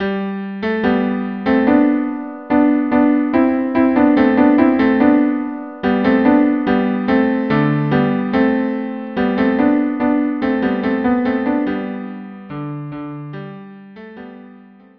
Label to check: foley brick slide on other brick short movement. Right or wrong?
Wrong